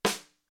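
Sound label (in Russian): снэр приглушенный с покерными картами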